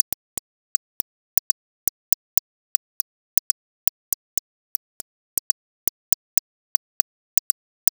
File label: Subtractive Beat